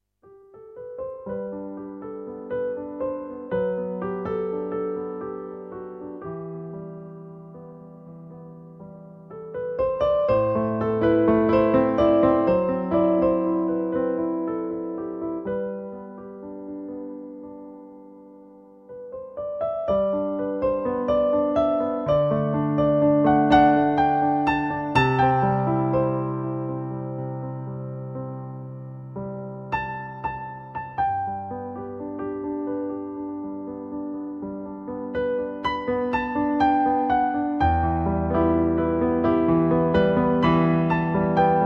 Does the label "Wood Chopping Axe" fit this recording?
No